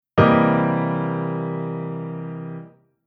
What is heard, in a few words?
Chord Poly; Jissen